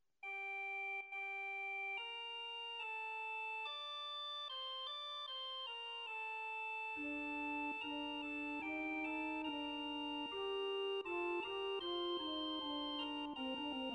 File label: Fagot, organ; Pachelb; Warum Betruebst Du